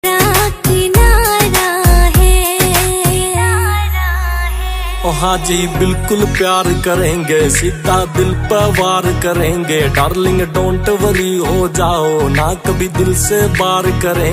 Haryanvi song

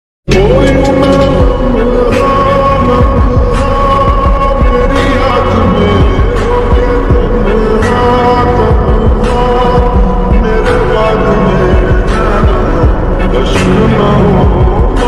song lofi remix

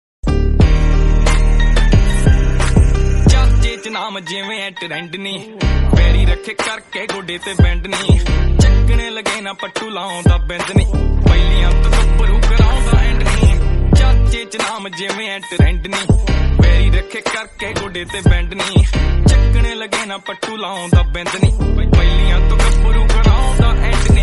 punjabi song ringtone

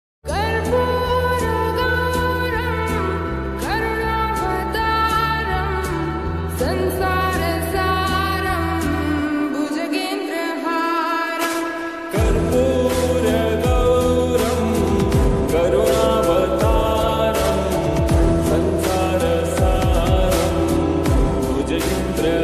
bholenath ringtone, lofi ringtone; mahadev ringtone; shivji ringtone; bholebaba ringtone

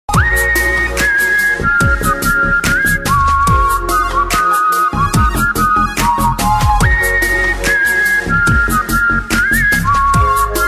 wistle ringtone; roy

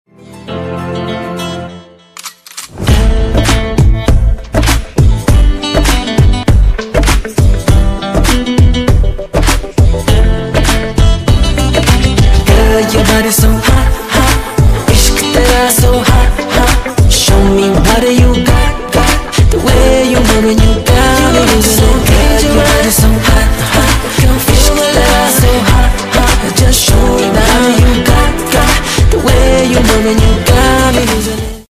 remix ringtone

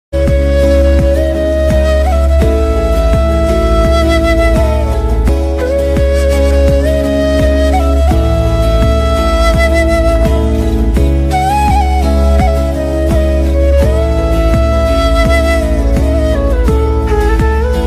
bgm ringtone flute bgm ringtone; life of ram

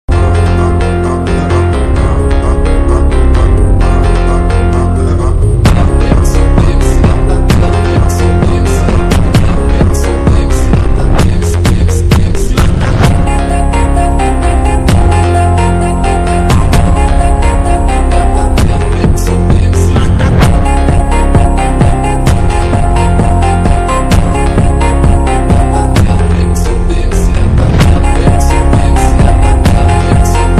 slay phonk ringtone

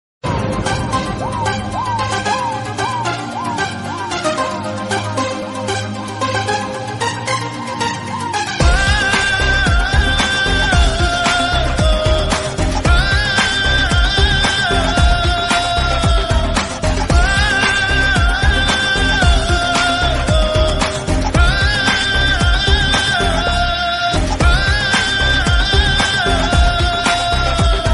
bgm ringtone; golimar; gopichand

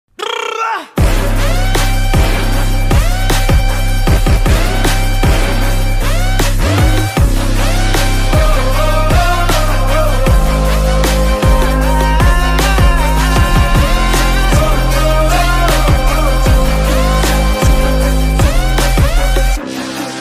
anthem bgm ringtone; bhairava; kalki; caron tunes

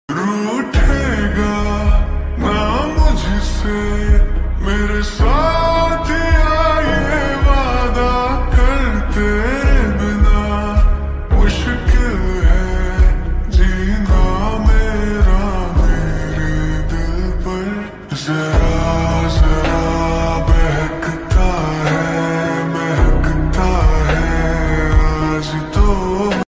love song

